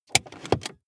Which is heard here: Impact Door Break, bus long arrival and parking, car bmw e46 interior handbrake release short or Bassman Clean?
car bmw e46 interior handbrake release short